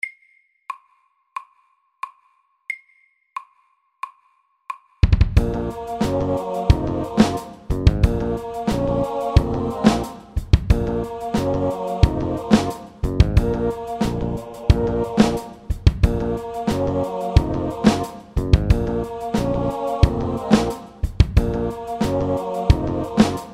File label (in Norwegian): Octave rap FORT